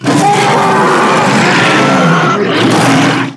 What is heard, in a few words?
diablos roar